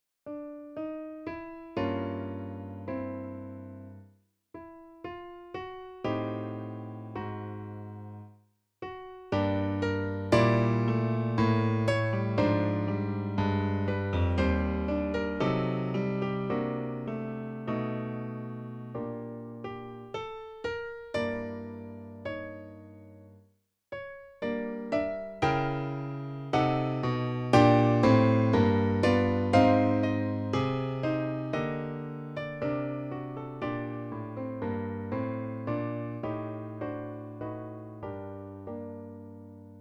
An example with minor/double diminished/diminished seventh chords on VII in a minor key